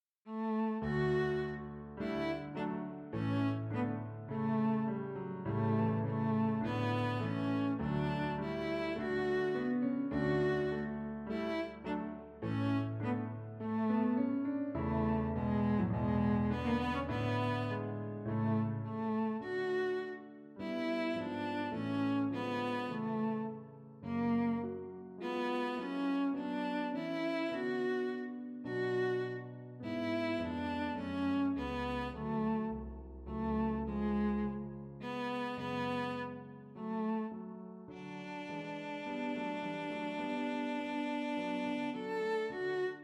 Melodie per violoncello e pianoforte.; Kummer F. A.; Melodie von Beethoven

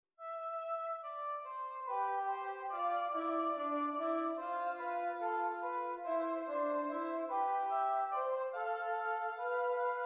esercizi di doppio contrappunto nella decima; Nottebohm pagina 144; Esercizio 2 corretto

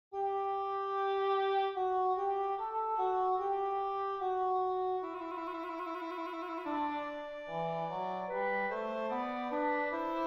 Fuga in Sol maggiore

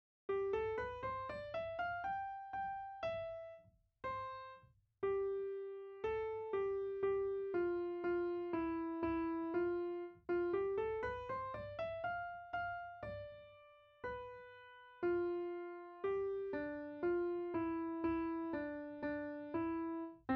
Sinfonia in do maggiore; Fase Definitiva revisione del I Gruppo II revisione

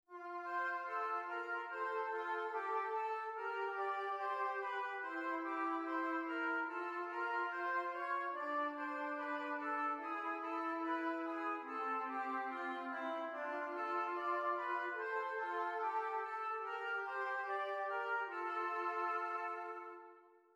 esercizi di contrappunto semplice A due voci; 4 gattung; Ronge

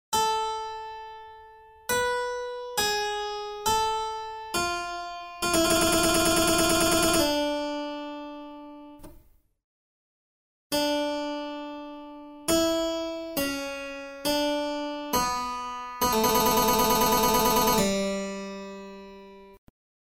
Tema e risposta

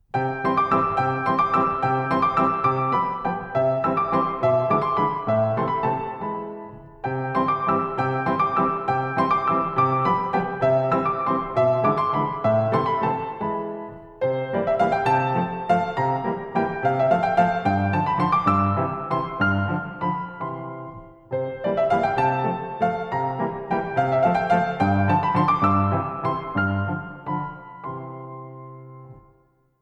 Valzer pianoforte; von Kapellmeister Gyrowetz

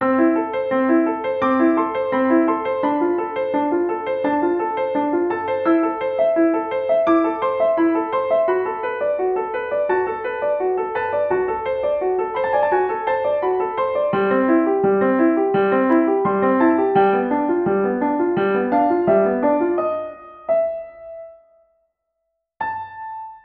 sonata in mi maggiore per pianoforte

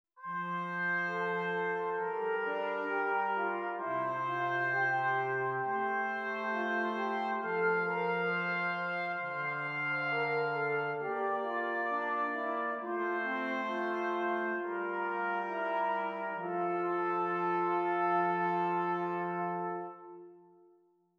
Esercizi di contrappunto a tre voci; Dritte Gattung; Ronge pagina 65

